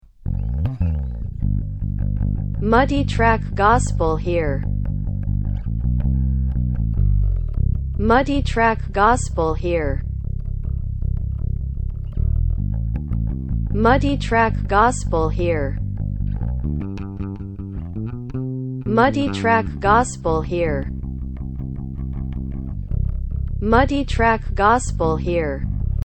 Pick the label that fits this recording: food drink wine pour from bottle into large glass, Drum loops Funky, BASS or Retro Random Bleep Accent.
BASS